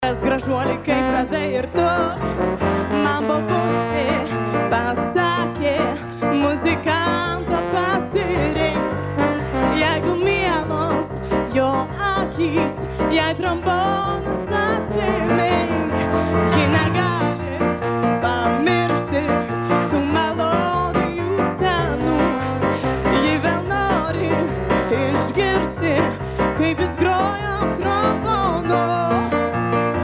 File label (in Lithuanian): trombonas